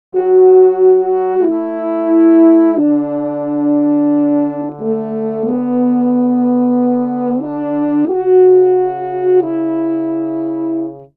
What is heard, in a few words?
90 D maj brasses, Orchestral arrangements